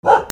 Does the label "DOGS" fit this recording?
Yes